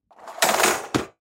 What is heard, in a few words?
Dishwasher Shelf, Dishwasher Shelf Open Empty Movement; General Electric Ge Appliance; Household; Blastwave